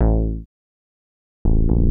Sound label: HOUSE BASS 125 A